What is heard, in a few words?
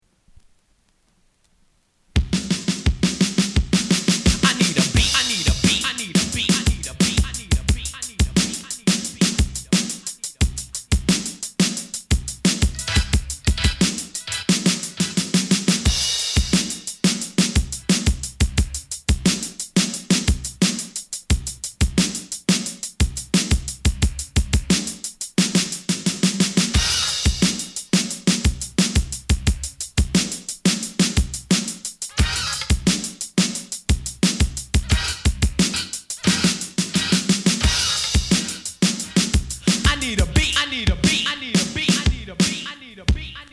(Instrumental); Def Jam